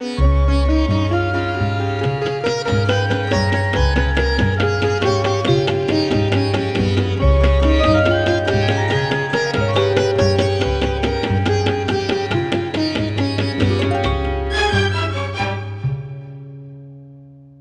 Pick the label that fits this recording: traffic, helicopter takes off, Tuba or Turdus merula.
Tuba